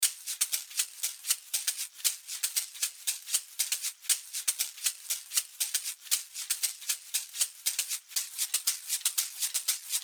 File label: caxixi sample